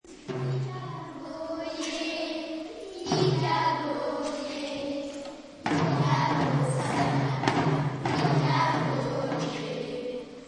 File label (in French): Chant